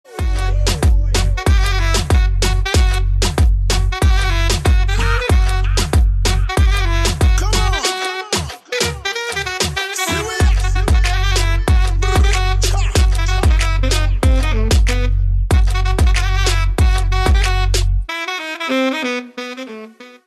saxophone ringtone; android

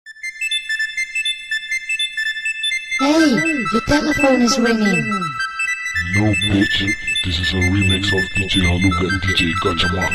panasonic ring remix ringtone

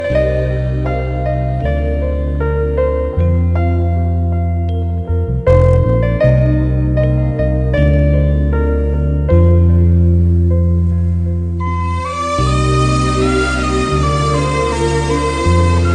classic piano ringtone; android

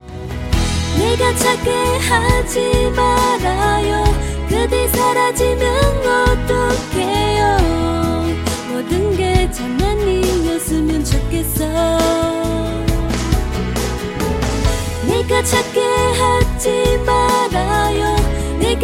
korean song ringtone

